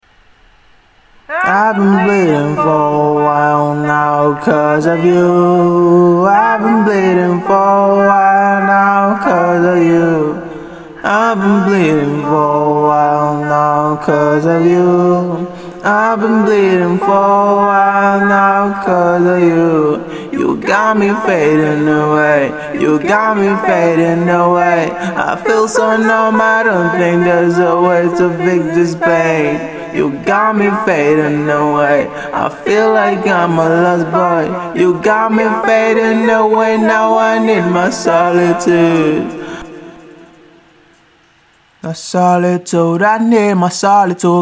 lo-fi, 130, Gm, acapella; Fadin Away

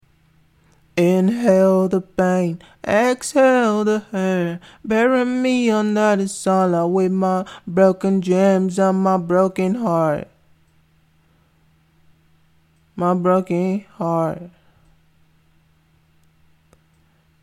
chill out 91 Gbm, Acapellas; Inhale

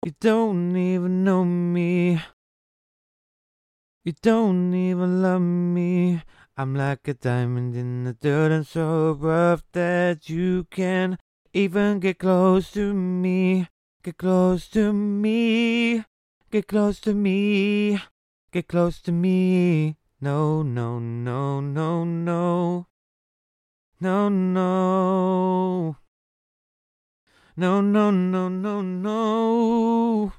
rnb 130 Ebm, Acapellas

